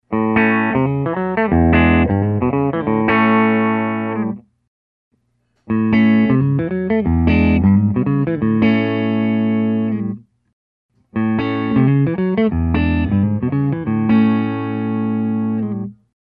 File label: Hollow, pickups; Hollow T M2 & M1; pickup selection changes from bridge to both to neck.; Anderson; Pickin